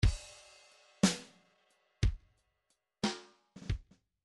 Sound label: midi file grooves 3 4